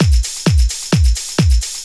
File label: drum 130 straight; Techno Trance; DRUMLOOPS; Techno Trance Essentials